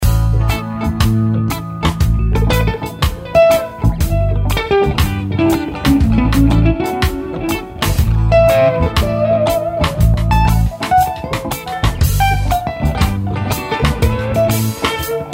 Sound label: A Mixolydian Parallel